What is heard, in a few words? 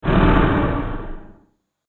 Horror Beast One Shot, monster